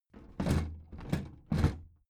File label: big plastic case lid put down soft